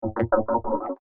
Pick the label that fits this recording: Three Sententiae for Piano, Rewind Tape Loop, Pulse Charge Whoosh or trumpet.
Pulse Charge Whoosh